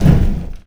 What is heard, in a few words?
Impact Small; Totaled; XBOX